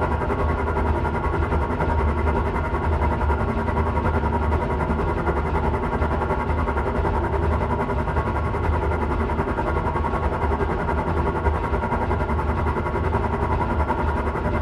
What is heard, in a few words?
vent fan oscillation; Titanfall 2